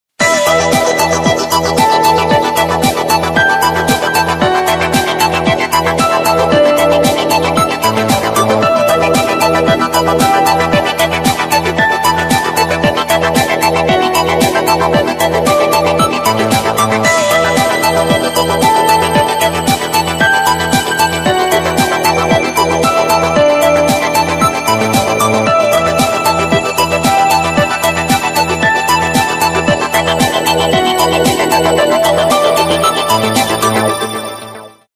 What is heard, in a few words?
Ringtone; Samsung GT-S3500H